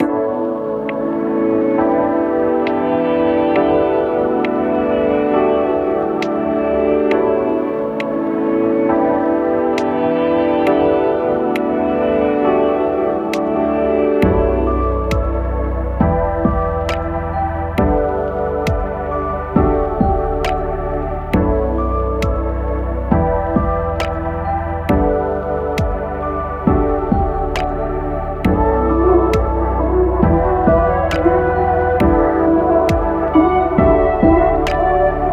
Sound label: LOFI MUSIC